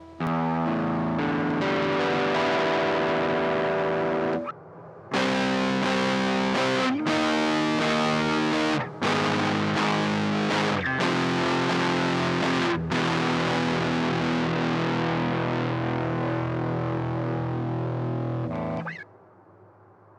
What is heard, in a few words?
distortion